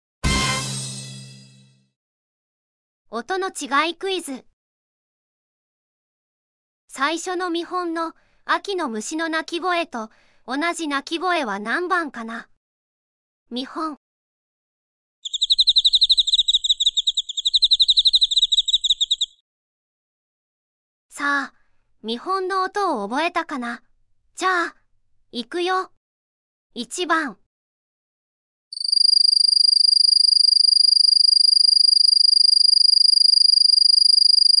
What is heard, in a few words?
quiz autumn insects